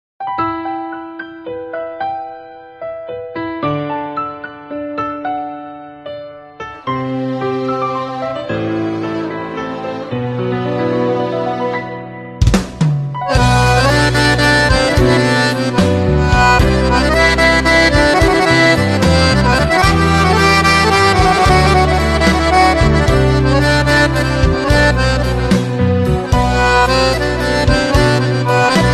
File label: Accordion, play back